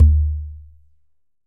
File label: Tumba C Sub Hit, Latin Percussion; Drums; Drum Hits; Conga; Ableton; Factory Packs